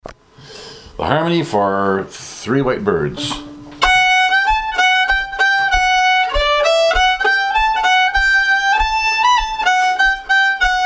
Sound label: harmony birds, violin